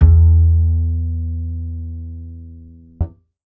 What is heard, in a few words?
bass acoustic